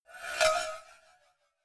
cowbell scratch hit clank hard

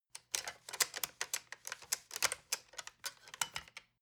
decoding machine handling rattle and clicking

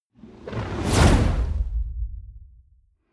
whoosh fire zap flutter

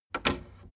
tape deck open slide

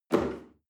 chair wood take hard

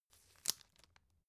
tissue open soft

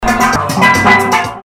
steeldrum

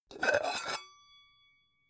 pan open hard

